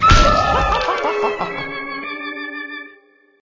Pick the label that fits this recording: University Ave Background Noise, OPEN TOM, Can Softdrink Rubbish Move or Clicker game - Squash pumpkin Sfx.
Clicker game - Squash pumpkin Sfx